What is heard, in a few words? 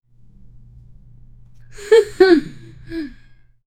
soft laughter warm hugpat